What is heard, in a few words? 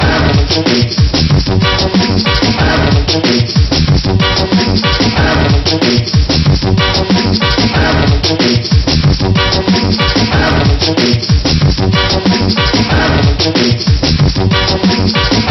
Ditty; Fox Sports NBA Basketball 2000; PS1